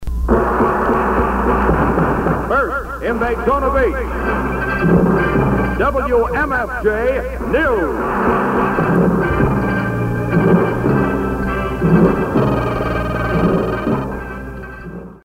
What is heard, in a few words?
news open; 1450 WMFJ